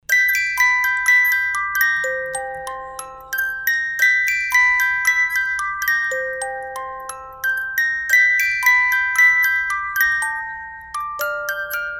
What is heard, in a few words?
musicbox